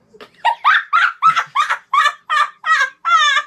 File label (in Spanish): mi risa cuando era nino